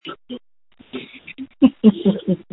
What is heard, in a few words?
screams